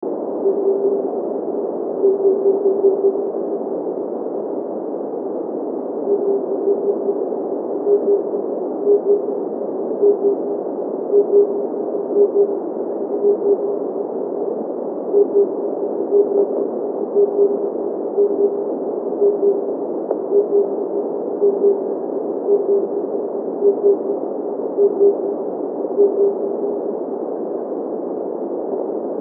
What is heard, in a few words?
Cuculus saturatus